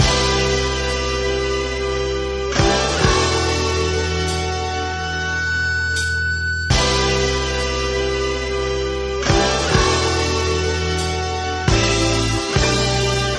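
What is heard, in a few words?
guitar feedback, Jazzmatazz Loops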